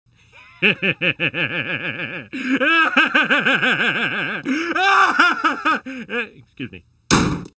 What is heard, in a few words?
evil laugh; homer simpson